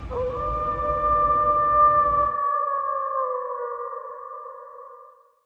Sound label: timberwolf howl